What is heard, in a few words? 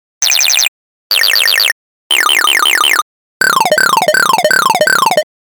Game Ish